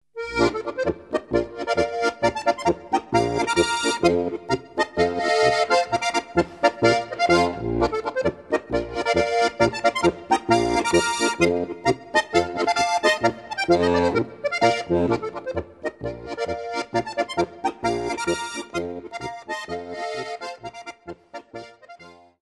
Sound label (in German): polka; holznerpolka